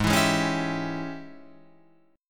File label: Ab Major 7th Suspended 4th Sharp 5th Ab down Guitar Open F; AbM7sus4#5 strummed